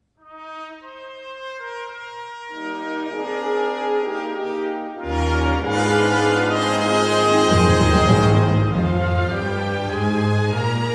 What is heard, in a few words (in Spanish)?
Tu gracia cantare